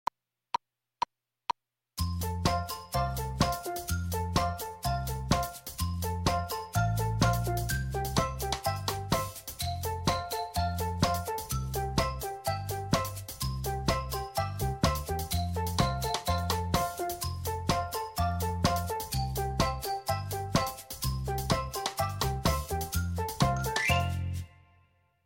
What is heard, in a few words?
Piano